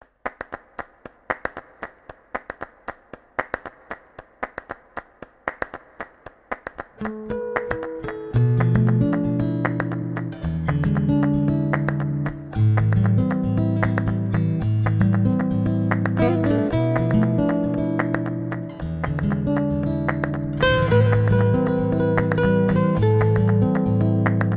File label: flamenco vibes 432 hz